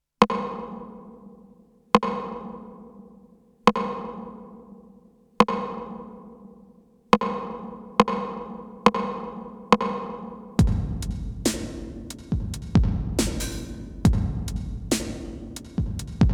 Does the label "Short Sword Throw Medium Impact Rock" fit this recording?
No